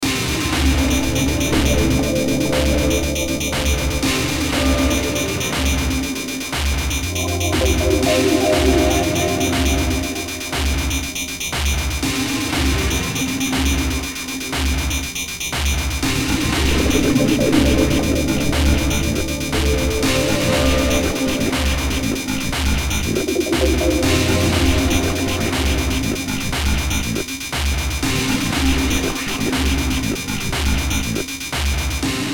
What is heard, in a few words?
BEAT INDUSTRIA, Beat Industrial Distortion Record Scratch; Miscellaneous Loops